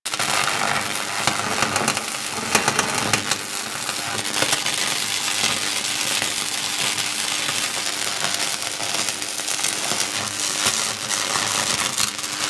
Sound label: Worker Welder Loop, weapons; Grn Worker Welder